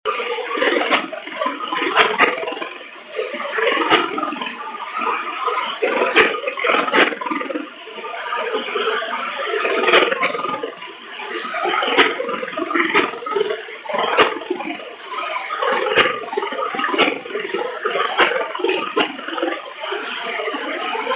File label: Radio after ultrasonic spectrograph and portable tv